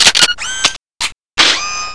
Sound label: gun reload, weapons